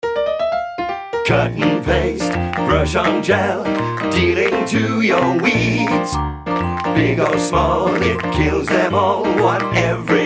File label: Full Sung